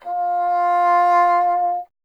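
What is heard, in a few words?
wind bassoon F; Miroslav Vitous - Solo Instruments VOL-1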